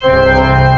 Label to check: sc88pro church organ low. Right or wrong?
Right